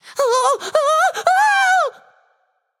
fear, voicepack; smite; jorm

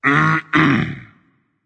content berserk; cuchulainn

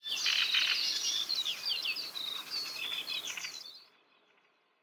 ambience bad birds; smite; conquest

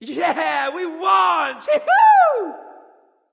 nv yay; smite; voicepack; xbalanque

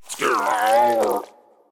minion death grunt; smite; npc; roman archer

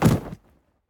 death bodyfall; Smite; god Kuzenbo v2

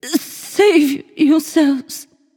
Death; smite; nuwa

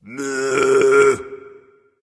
tongue, voice; Smite; ymir skin12

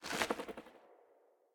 gugnir feathers; smite; odin

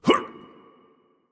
hitreact human male small

